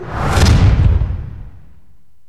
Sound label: flyby